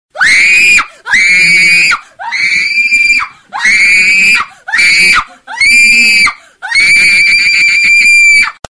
Womens Scream

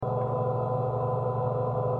Engine or bunker Loop; Dj Chronos

